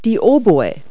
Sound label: oboe